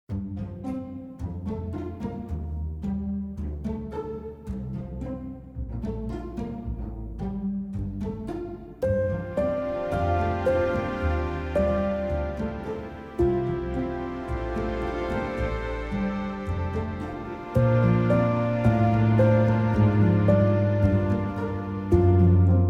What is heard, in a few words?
Metronome; Before Your Eyes